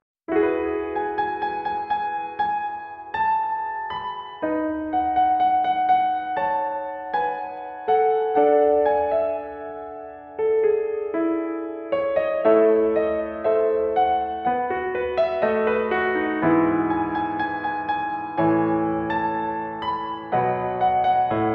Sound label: piano ver.